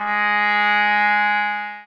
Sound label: sax